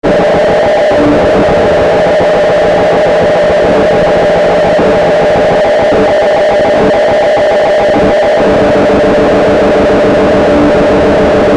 inharmonicity of overtones

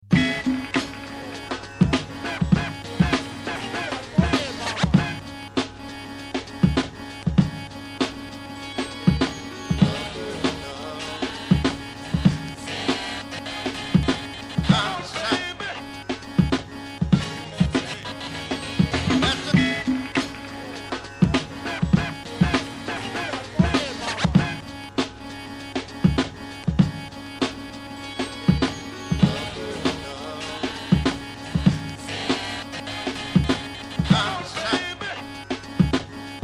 beat sketch

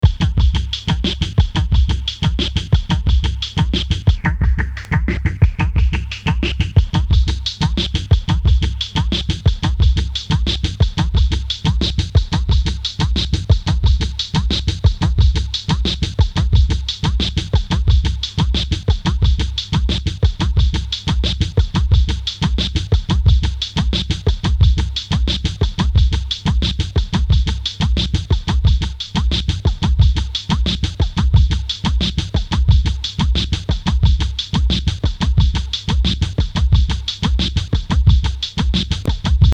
beat filters loop

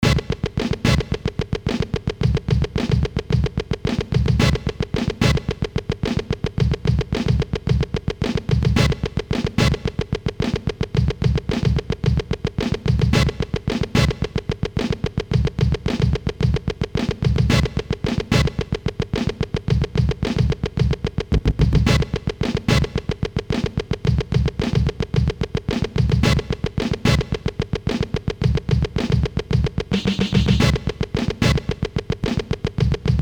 beat sketch sp1200

